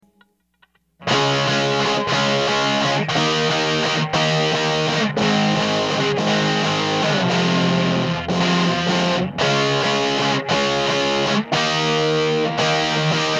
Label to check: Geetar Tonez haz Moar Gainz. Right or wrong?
Right